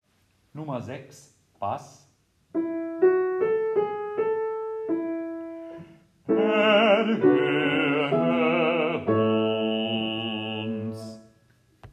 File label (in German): Bass; Dioezesan Caecilien Verband; DCV Chorfahrt; Übaufnahmen